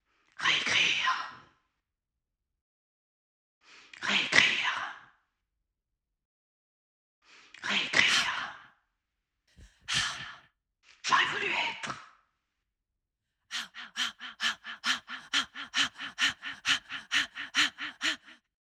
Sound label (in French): souffle 133